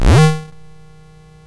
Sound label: Frogs and Flies, MOS6581R2